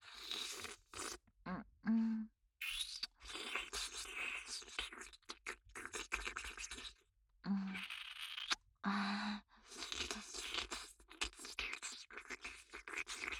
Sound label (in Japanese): フェラ, ASMR